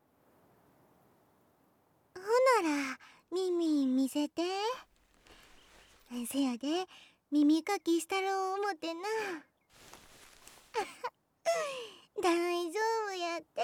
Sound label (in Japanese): 耳かき